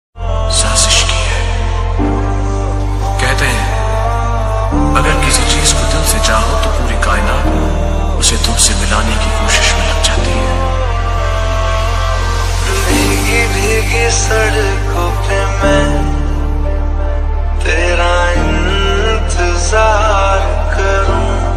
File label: Remix Ringtone